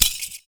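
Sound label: PERC, percussions; Univers Sons - Raricussions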